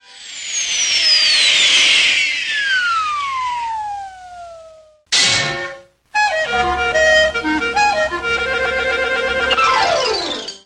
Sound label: coolest sms tone